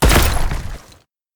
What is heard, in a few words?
cy punch